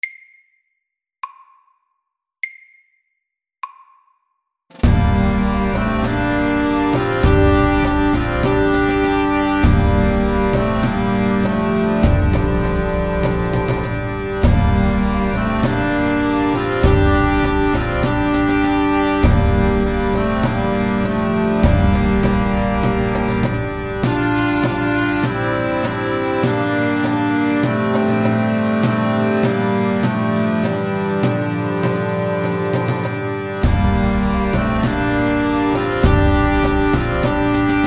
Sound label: Pipes and drums